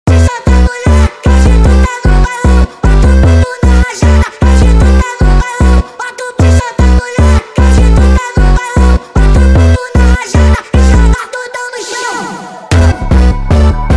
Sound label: Funk sped Up